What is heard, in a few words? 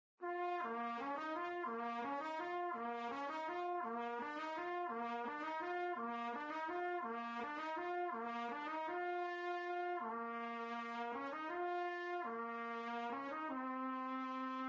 Trumpet; Zomboid; Lifestyle